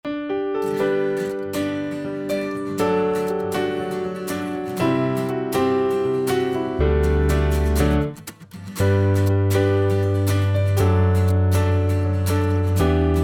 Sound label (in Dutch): Alleluia instrumentaal; kyriale